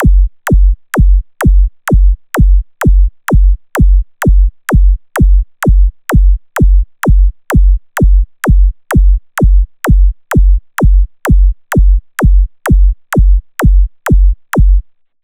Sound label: Beat 128 bpm